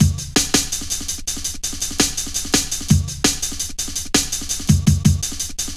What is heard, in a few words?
Fat Rolla, Jungle Drum Loops 166.5